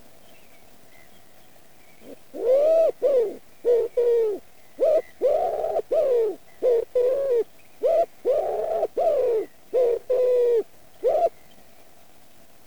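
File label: wood pigeon columba palumbus; vyahir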